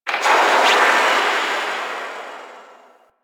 Landscape Construction Site One Off, Ambience

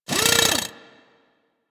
Drill Punch; Warframe; Grineer Ghouls; Ghoul Drill Sergeant; Attacks

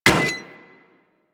Melee Axe Impact Generic; Warframe; Weapons; Axes

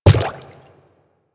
Quadruped Medium Walk Water, Footsteps

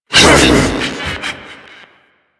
Ape Prelate Attack B; Enemies; Warframe; Proto Infested

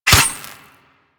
Melee Dagger Impact Robotic Flesh

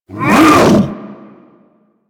Infested Jetpack Attack Melee Swipe; Warframe

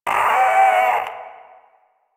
Possessed Red Veil Vocal Death; Enemies; Warframe; Priest Quest

